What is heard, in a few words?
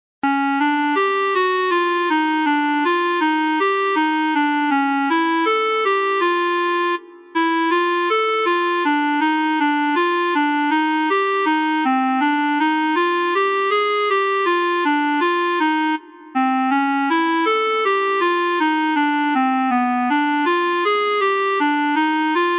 Rhythm Changes